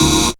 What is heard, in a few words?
synth, c#, Rave Synths; Best Service ProSamples vol.37 - Dance Synths